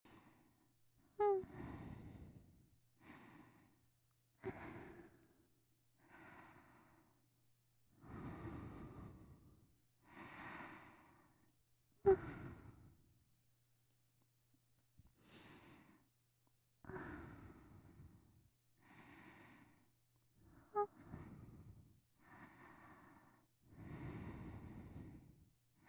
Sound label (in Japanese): 寝息ループ